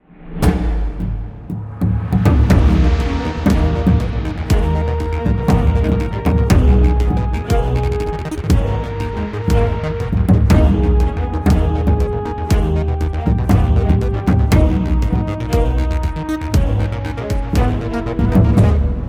Menu music

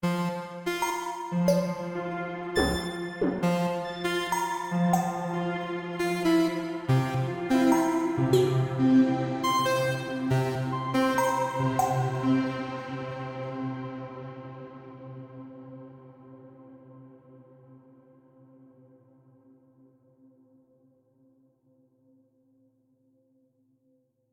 Middle Loop; Mixolumia; Tessellation

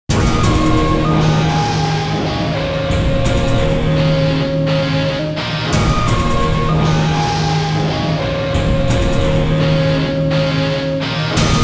Lose; Initial D Arcade Stage; Sega

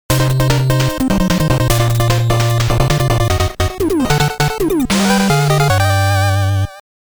Get Ready (Game Start); Mega Man Time Tangent: The Fight for History

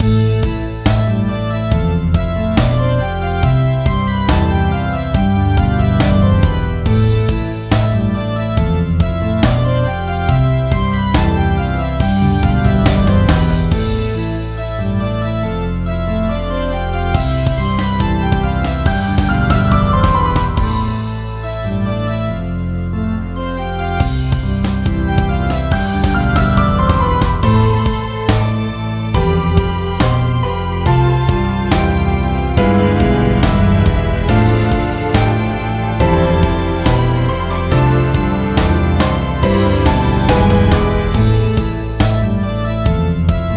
Chaos Dungeon - Dungeon Track; Black Souls II

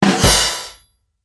Ui Combat Wheel of fortune Main prize; Angry Birds Epic

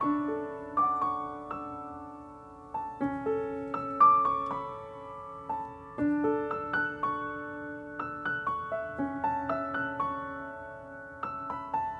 Music Level Dreams

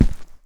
Footsteps Rug

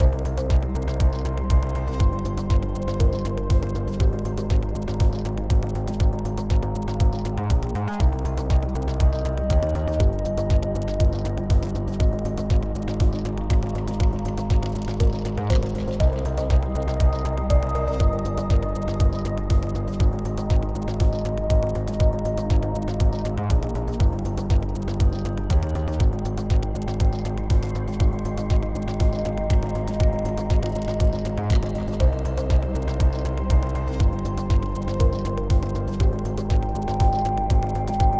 Dash, 120BPM; TheView Act1

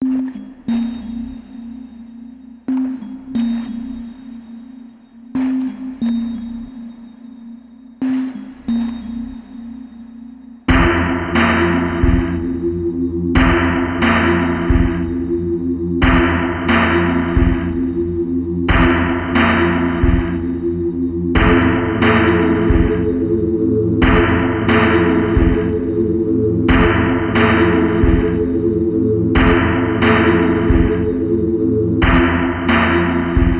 Strange Noise; silent hill tribute

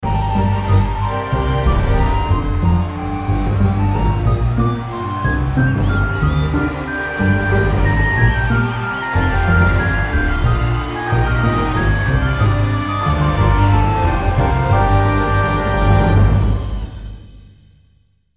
Castle Battle Won; Heroes of Might and Magic: A Strategic Quest; 3DO; MS-DOS

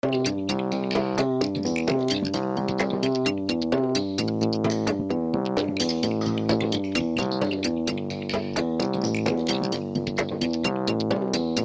Wub Wub; Regretevator

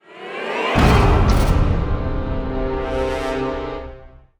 Dumdumdaah Kinda Sting; jenny leclue detectiv

